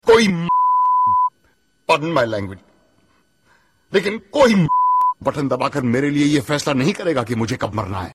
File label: unpluged